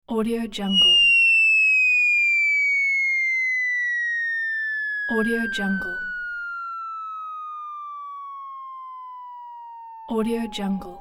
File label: long fall whistle